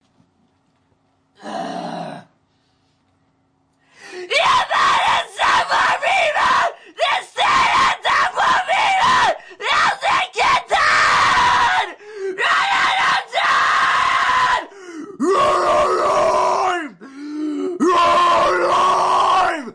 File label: male vocal scream, 180 bpm; male acapella